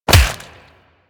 Melee Fist Impact Flesh, Weapons Melee Impacts Fists; Warframe